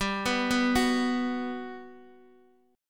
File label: G Major 7th, Guitar, Open D; x,x,5,5,2,4; down